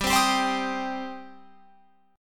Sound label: G Suspended 4th Sharp 5th, Guitar Open F; Gsus4#5 strummed; 19,19,19,19,22,22